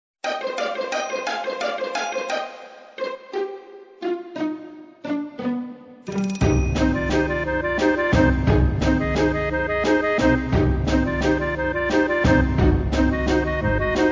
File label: waltz; ff9 plus